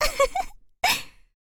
girl ufufu

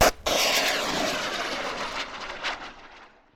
missile

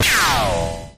ricochets

